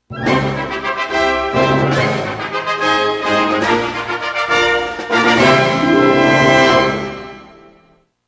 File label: Team Victory; ssbm; Mario